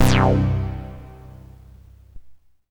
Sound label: MASS LEAD, Synth; InVision Interactive; Keith Emerson; The Most Dangerous Synth and Organ; SYNTH LAYER